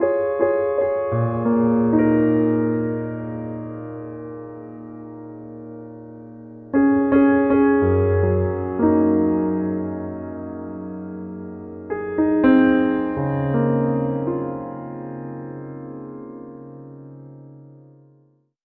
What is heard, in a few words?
Piano